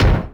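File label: metal impact; Matt Hoffman's Pro BMX 2; XBOX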